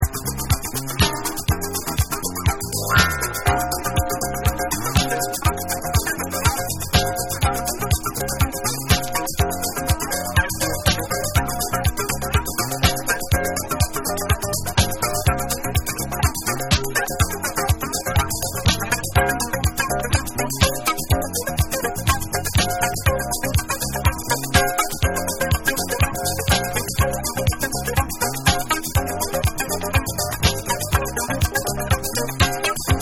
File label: Whistle Bump